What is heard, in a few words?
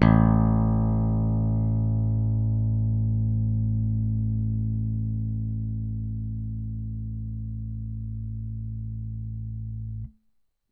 BELDEN 8412 pick